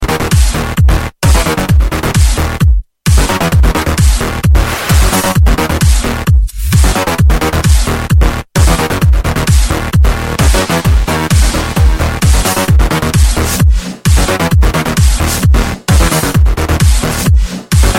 nice russian music ringtone

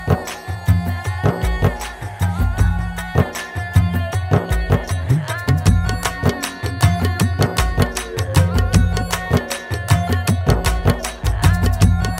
trance percussion ringtone; manali; bollywood

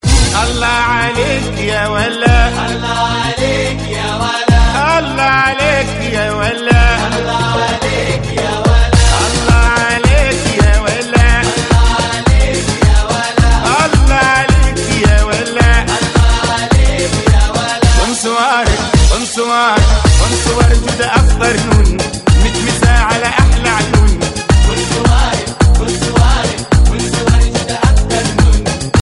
arabic ringtone

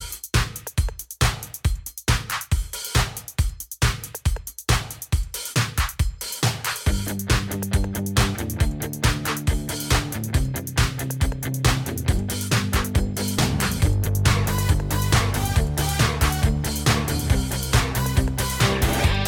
huawei p20 ringtone

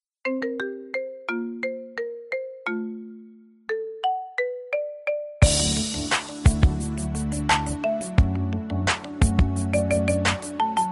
marimba ringtone

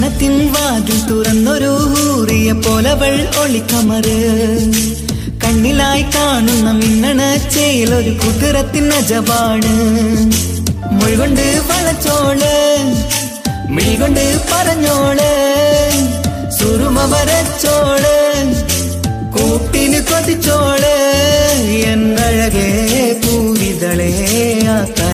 malayalam ringtone

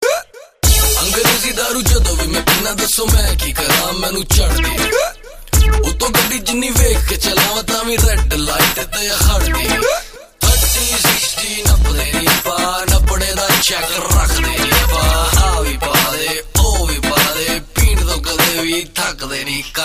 rap ringtone; bollywood

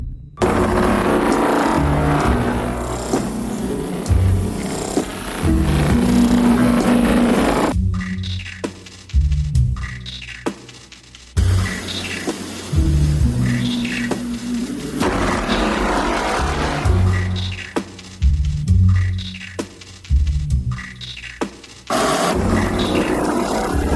horror ringtone

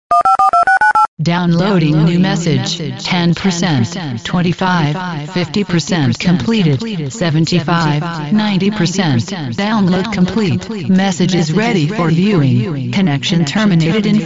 download msg ringtone

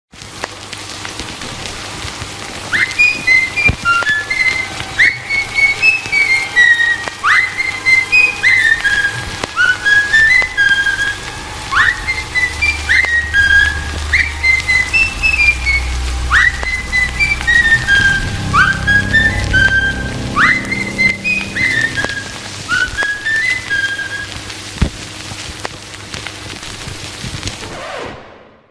raining whistle cartoon music

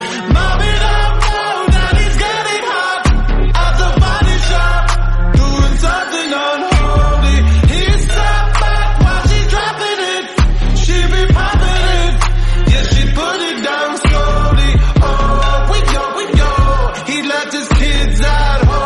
English song tone ringtone

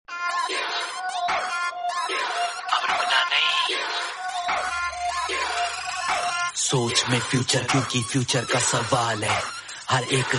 most popular call notification sound ringtone

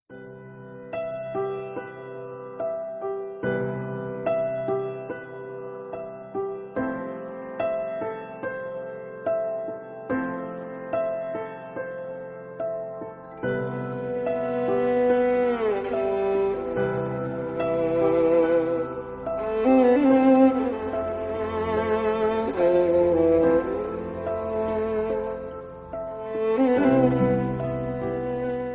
trending romantic sad music tone ringtone; iphone ringtones

